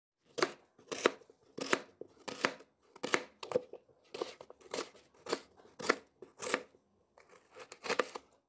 cutting vegetables

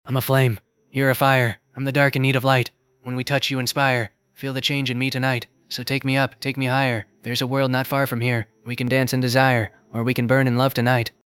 singing

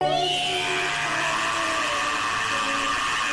screech; caseoh

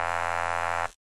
buzzer

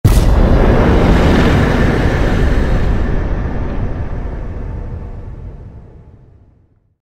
victory sounds; elden ring

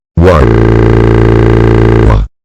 cry

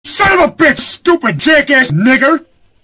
sob; peter griffin

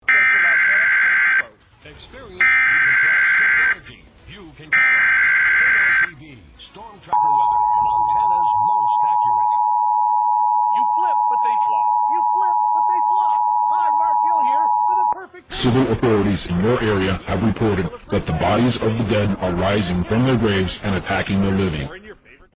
hacked eas alert

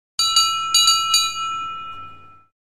bell; toby fox

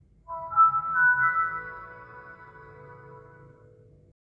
windows 3 startup

